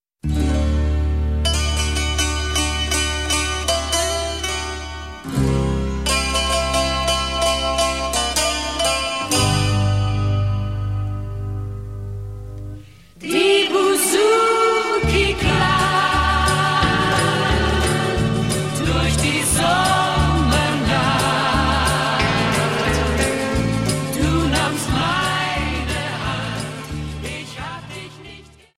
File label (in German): die bouzouki